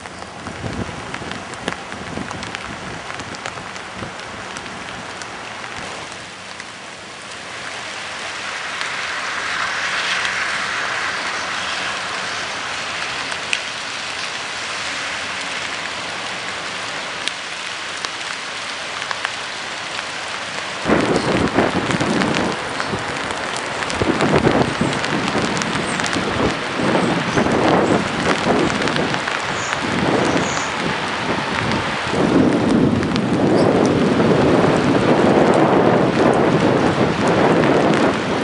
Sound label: hawk RT